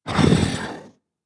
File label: MUDRAPTOR idle